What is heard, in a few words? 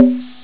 risset drum 8bit pcm unsigned; gb; wav sample